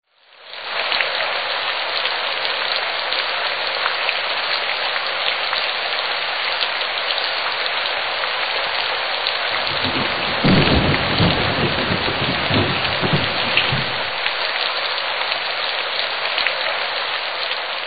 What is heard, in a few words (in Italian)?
Pioggia Temporale; Versi Animali; Il Forum della Fotografia Naturalistica